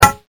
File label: pipe bang